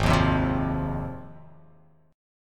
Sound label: G sharp 6th G sharp down, chord